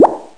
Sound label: pop